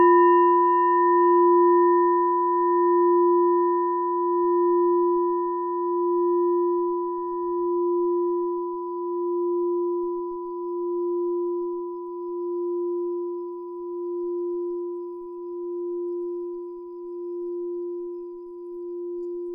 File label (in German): klangschale nepal, Klangschalen Nepal